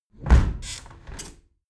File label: window close heavy sequence